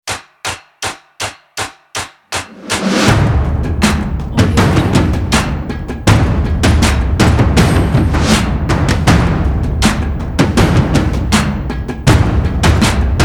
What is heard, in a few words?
big drums cinematic logo